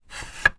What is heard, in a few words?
foley wooden slat movement slide clunk